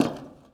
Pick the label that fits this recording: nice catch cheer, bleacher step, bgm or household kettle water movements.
bleacher step